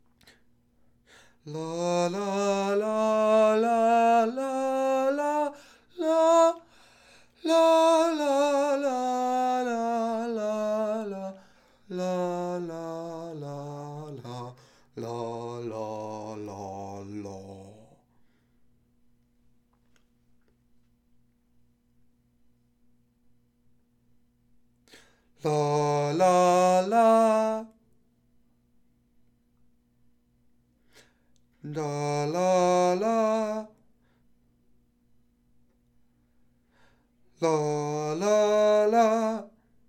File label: Baritone; allstate2024 jrhigh; Audition